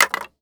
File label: bones; skyrim